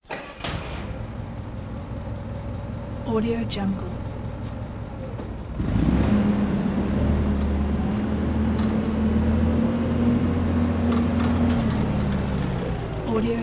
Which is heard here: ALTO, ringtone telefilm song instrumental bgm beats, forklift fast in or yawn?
forklift fast in